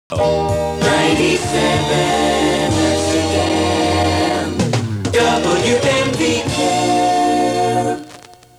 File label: radio jingle